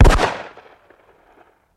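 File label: 357 Magnum Single Shot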